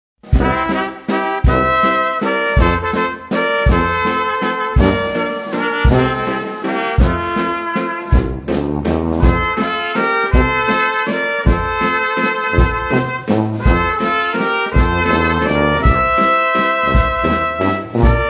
waltz

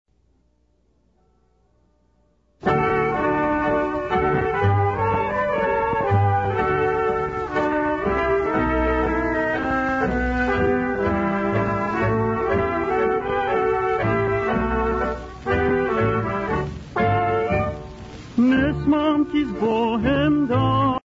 PROMISE ME TANGO

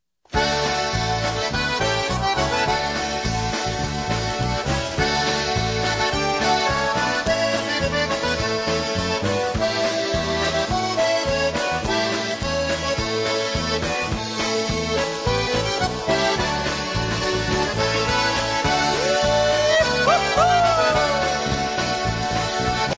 polka

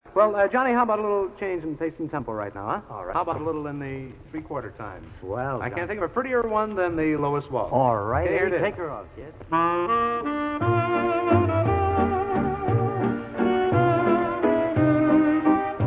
waltz

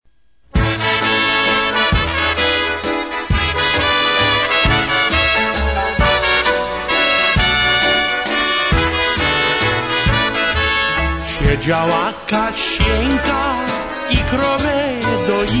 WALTZ

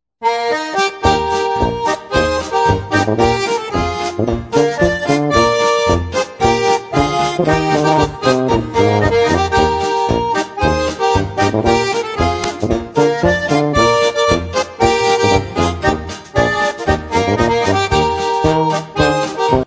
FLUTOPHONE POLKA

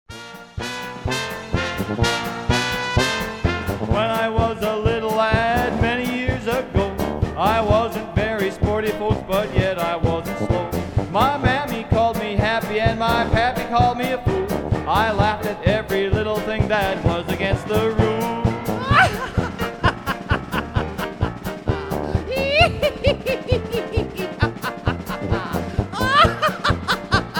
Polka

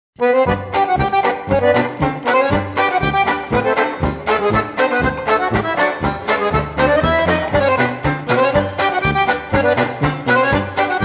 polka

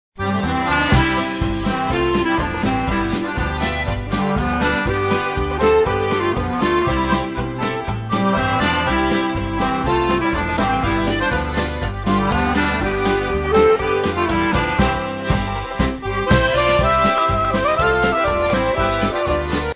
FLUTEPHONE POLKA

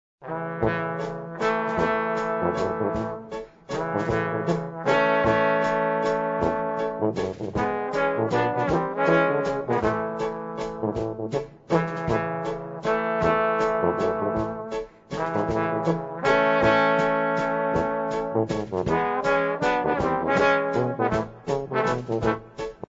DEER WALTZ

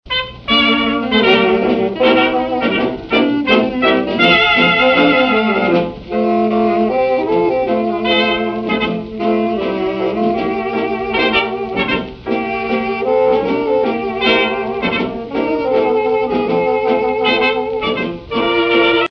TANGO

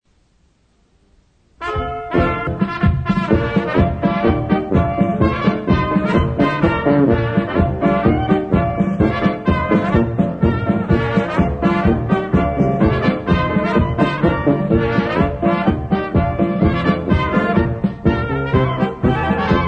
TWENTY CROWNS POLKA

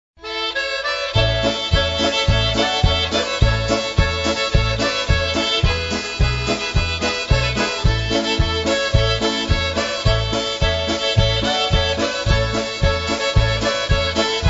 polka

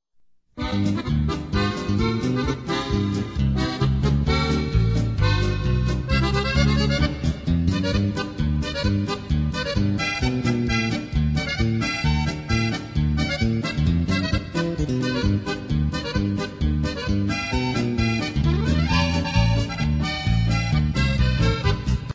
POLKA

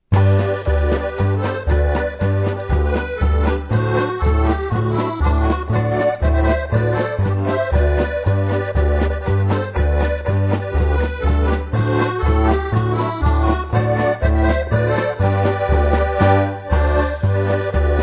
WINE BARREL POLKA

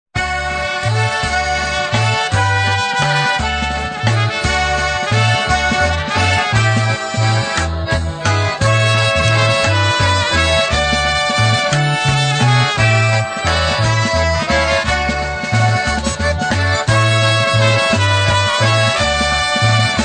waltz

